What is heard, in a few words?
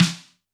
Snr, drum